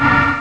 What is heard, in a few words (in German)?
chor; populous; Amiga Soundtracker Sample Packs